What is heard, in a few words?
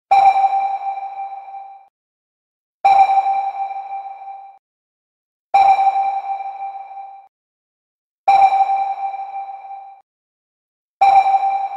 U Boot Sonar Ping Sound